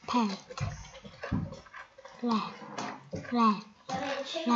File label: rat